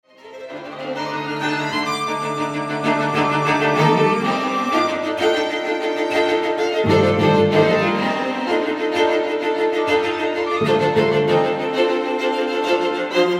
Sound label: String Quartet excerpt 2nd mvt